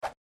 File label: swoop back